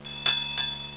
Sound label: hi bell; Sound Effects for Multimedia - Volume 1